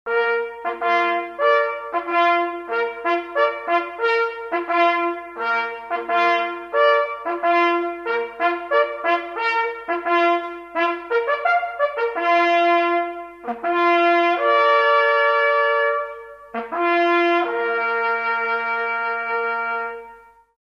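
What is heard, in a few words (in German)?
Rehwild tot, Jagdhornblasen, Totsignale; Brauchtum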